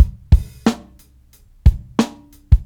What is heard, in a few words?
jackyl, Drum loops Boombap